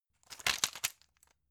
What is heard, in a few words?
plastic blister handle